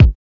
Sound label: 00s short reverb bass drum sound a sharp key